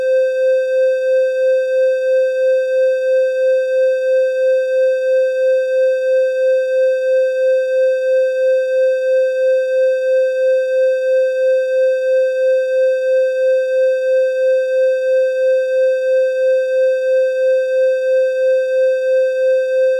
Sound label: buzzer